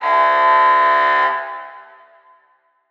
Alarm; Alarms, Beeps

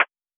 Lofi Percussion

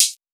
Hihat, Closed; House; Drum One Shots; Hihats - Closed

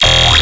Bass Hit E, Dubstep; Gleam Bass; Ghosthack; AC2024 - Day 13 - Dubstep Sounds 2025

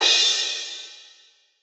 Crash, Cymbals; Cymatics; Eternity Sample Pack; Drum One Shots

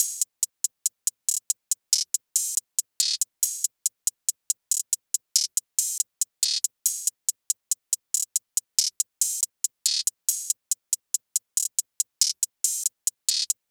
Drifting Hihat Loop - 140 BPM; Cymatics; Fiji Hihat Loops & Percussion

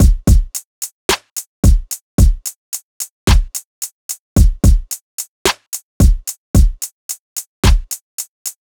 Full Drum Loop 110 BPM; Drums; Humble Hip Hop Sample Pack; Loyal

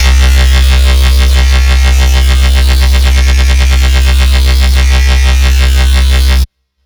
Bassline C3 140, Dubstep; GhostHack

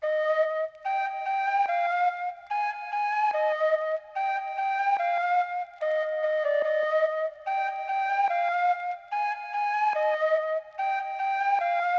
Various Melody Loop - 145 BPM C Min Flute; Melodies; Loop Stems; Cymatics; Oracle Sample Pack

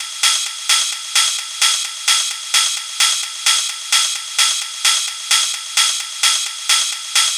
Ride Loop 130, Techno; Loops; Rides; GhostHack; Ghosthack - Free Techno Sounds 2024

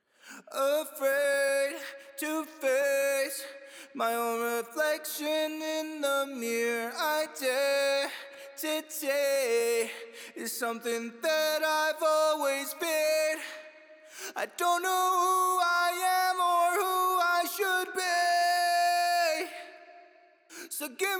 Heavy Harmony Wet, vocals D#min 150bpm; Acapellas; Stems; Ghosthack

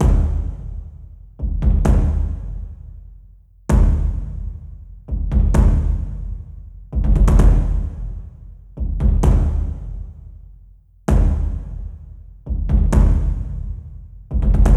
Crushed Taikos - 130BPM; Percussion; Ghosthack; SkyRealm; Percussion Kits; Kit 20 - Prepare For War

